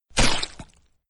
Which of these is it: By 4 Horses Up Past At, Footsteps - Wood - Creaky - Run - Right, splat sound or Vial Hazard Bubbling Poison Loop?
splat sound